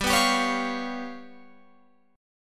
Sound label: G 7th Flat 5th, Guitar, Open F; G7b5 strummed